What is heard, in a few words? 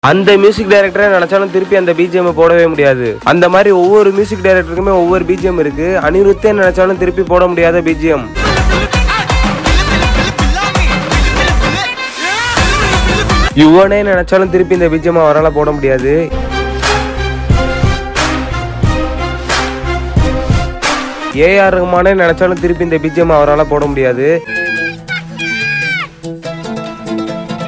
bgm tamil music